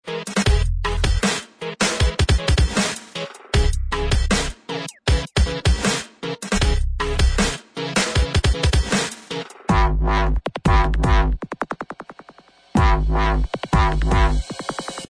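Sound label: dubstep remix instrumental, backing track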